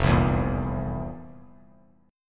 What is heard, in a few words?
F Major 7th Suspended 4th F; FM7sus4 strummed; JGuitar